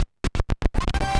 SCRATCHES

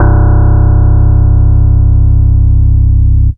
Single Electric Basses, Dance Floor Bass; Best Service

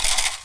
PU IL, HAWAII PERC

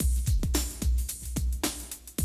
110, Classic Drum Loops

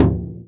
BASS, INDIAN PERC; Big Fish Audio - Didgeridoo and Other Primitive Instruments

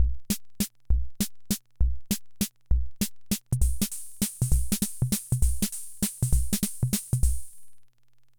Hammond Auto-Vari 64 Loop, drum machine; Hammond Auto-Vari 64 Ableto Project; 300 Drum Machines

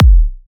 Kick; MINDMINE

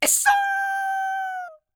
YELP, World Voices, ANDES FX; Spectrasonics Vocal Planet

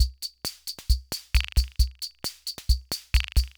Loop, sr-95; classic machines

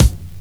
BD, BASS DRUM, 70's Breakbeats

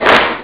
clap hands; Amiga Soundtracker sample

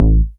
bass attack, techno trance

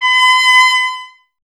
BRS TP, Trumpet; Miroslav Vitous

